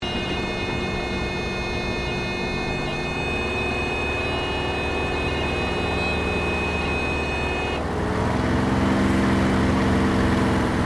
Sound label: AIRCRAFT SUPERMARINE SPITFIRE MK-XVI FLYING INTERIOR STEREO; Airplanes; Sound Effects:Vehicles